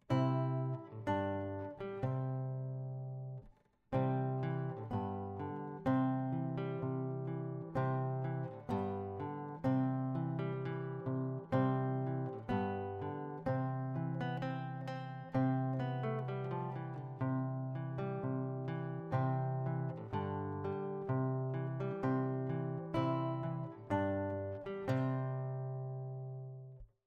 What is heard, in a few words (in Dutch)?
Gitaar